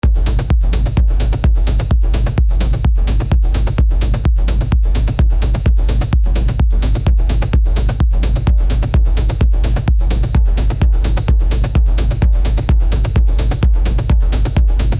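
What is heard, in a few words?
techno in the hall of, club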